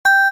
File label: One Min Beep; LG; KU3800 Bubble Pop